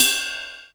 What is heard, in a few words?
KORG Electribe SX